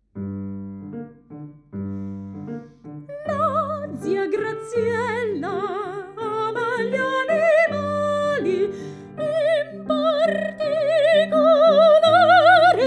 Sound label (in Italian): Voce; Pianoforte